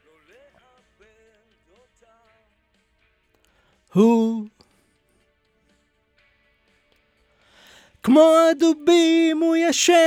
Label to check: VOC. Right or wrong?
Right